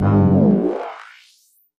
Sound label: Smooth Buzz Transition